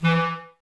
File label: clarinets, Wood Winds